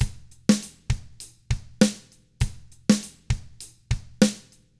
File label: ROCK, Drums; ILIO; Double Platinum Drums 1